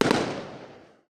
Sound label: bang; bang sfx